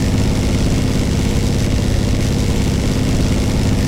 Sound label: leo2a5 engine rpm load, tanks; engines; War Thunder